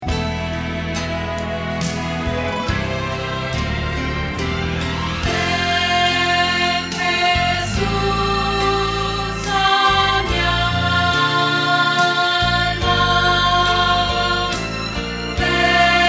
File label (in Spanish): Contralto